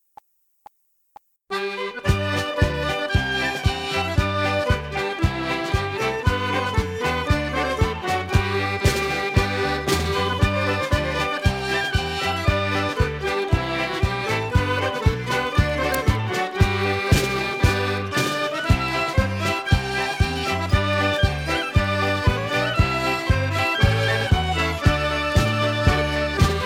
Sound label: Irish Ceili Marches Medley G, backing track